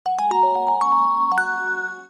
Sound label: missed call; KH5800